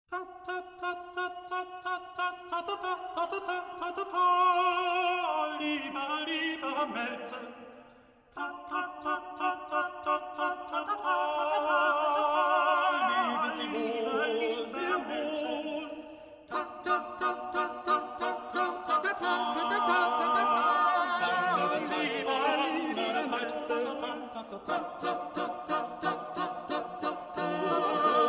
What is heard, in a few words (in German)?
Vierstimmiger Kanon; Ta ta ta Lieber Maelzel